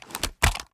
raise first end, reloads; iw8; uzulu; gmod

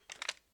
inspect, reloads; gmod

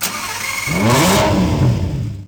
startup, vehicles

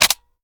reload empty mag mag in; gmod

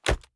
reload empty fast end, sh; reloads; iw8; romeo870; gmod

